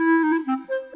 Clarinet; Sonic Foundry (Sony Creative Software); Orchestral VOL-2; Modern 27

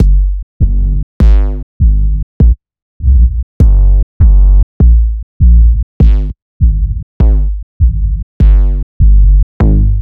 808 Kicks; M8; Sliced

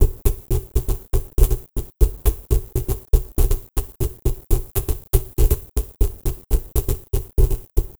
Envelope Beat; Drums & Drones

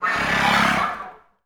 FL FX, Advanced Orchestra

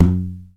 tube hi G; EMU Planet-Earth

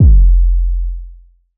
bass 808 thud F; Polyend; ARC Noise

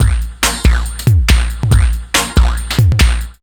Funky Beat, Drum N Bass; Sonic Foundry (Sony Creative Software); Knutrix Drum & Bass

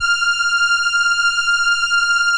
ELKA, String Machines; ELKA RHAP

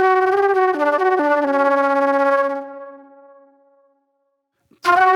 Sharp Delay Flute

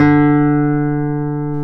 guitar classical guitar, nylon string; GTR; Roland LCDP02 Guitar and Bass

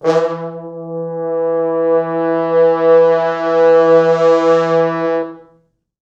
4 FrHorns

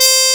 muted, SC55 brass

